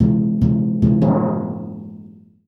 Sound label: BIG TIN, rhythm loops; Rarefaction - Poke in The Ear With A Sharp Stick